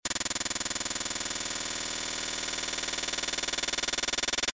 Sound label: white noise